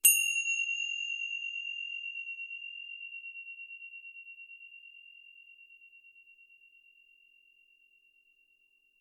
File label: tibcymb high sound